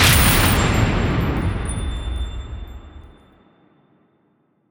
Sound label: big Win Start; asgardian stones